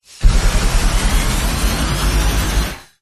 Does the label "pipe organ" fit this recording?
No